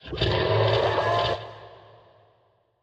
骷髅马水中嘶鸣声

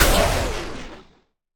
死亡声; 旋风人

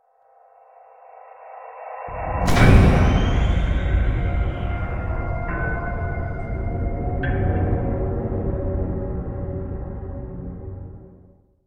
洞穴环境声; ambient

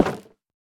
下界木栅栏门开关声

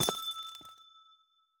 紫水晶踩踏声

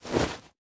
收纳袋倒出内容物声

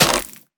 红树根破坏声

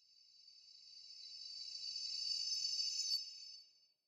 诡异森林末影声; ambient

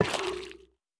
幽匿尖啸体放置声

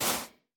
灵魂沙踩踏声

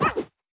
犰狳滚动声

